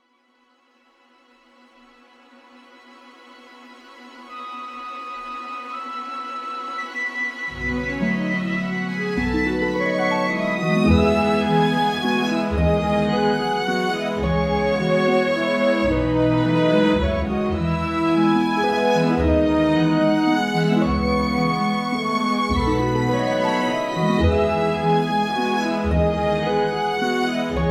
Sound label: Sappy Waltz